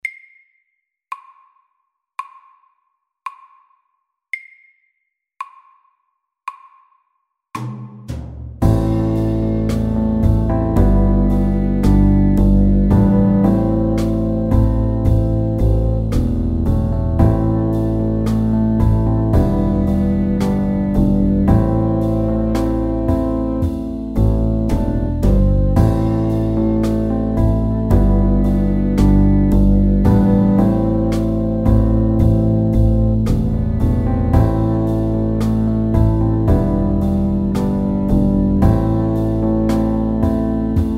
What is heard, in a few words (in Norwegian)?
Pop ballad SAKTE